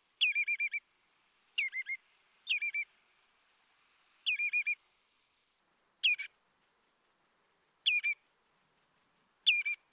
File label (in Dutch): Tureluur